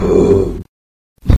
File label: Voices death